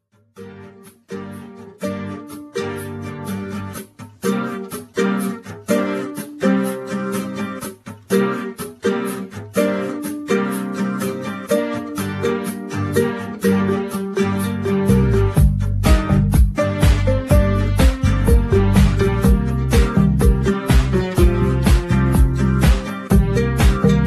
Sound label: eurogroove